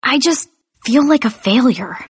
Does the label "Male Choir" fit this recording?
No